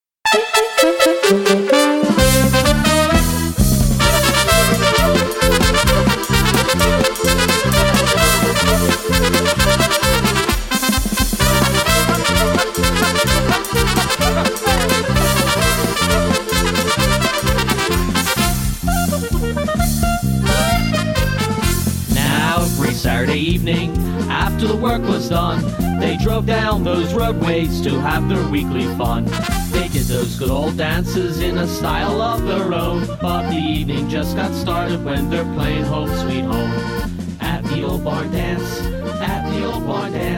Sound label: Barn Dance